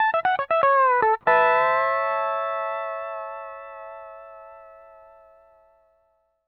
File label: L, Whole Lotta Country, ENDING120; PD ENDING120